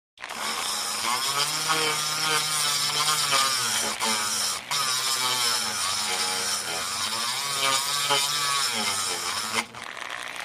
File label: Dremel tool grinds wood at variable speeds, then shuts off.; Tools, Hand Grinding, Tool Motor, Tool